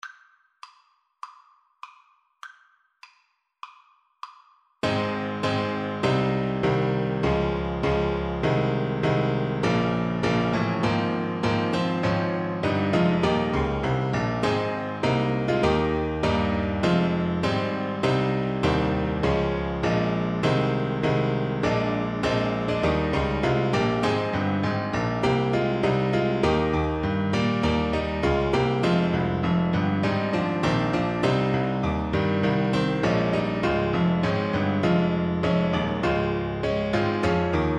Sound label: national anthem russia, Saxophone